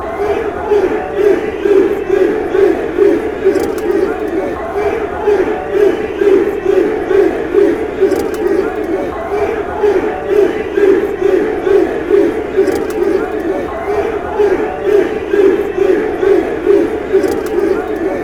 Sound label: chant, fanchants; beerschot